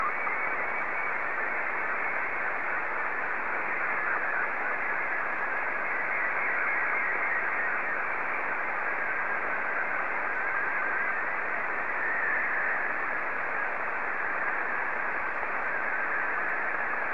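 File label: Scottie 2, slow-scan television; M3ARB; SSTV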